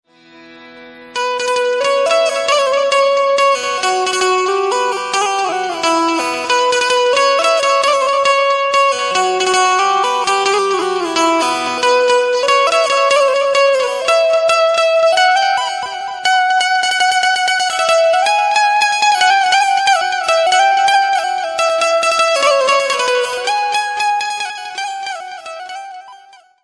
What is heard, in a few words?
gujarati ringtones